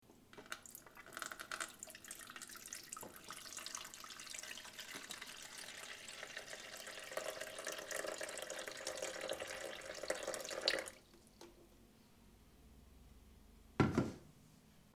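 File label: water in coffee machine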